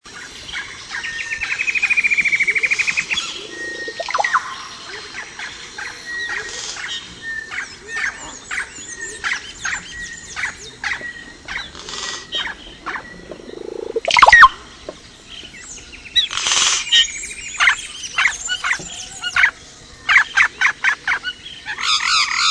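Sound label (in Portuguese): Canto da maracana de cabeca azul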